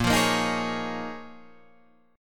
Bb Minor 11th Bb down Guitar Open D

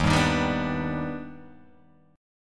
D sharp Augmented Major 7th, Guitar, Open G

